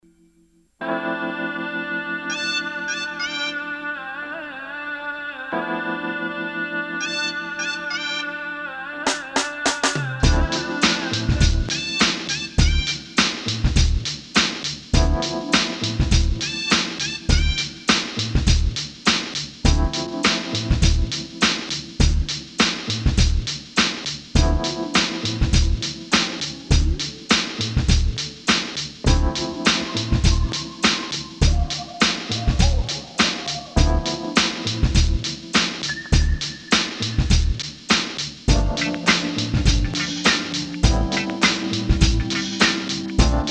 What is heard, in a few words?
Mad Sax